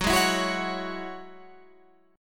Gb 7th Suspended 2nd Gb Guitar Standard, Gb7sus2 Chord; strummed; JGuitar